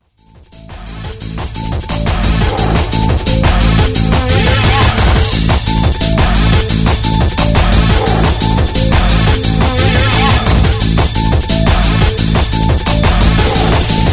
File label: distressed frequencies dark ocean; emote